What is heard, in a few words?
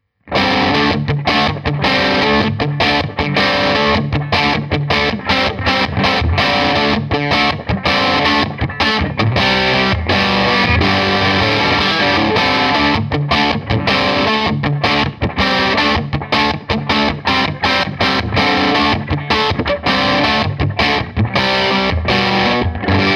Crunch; Koch Amps Little Gristle